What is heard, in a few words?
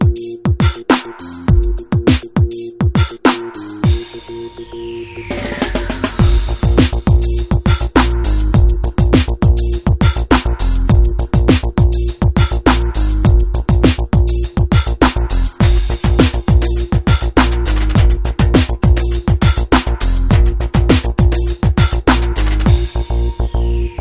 Beat